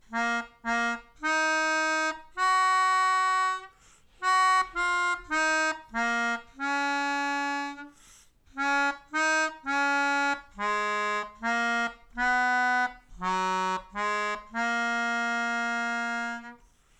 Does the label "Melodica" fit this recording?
Yes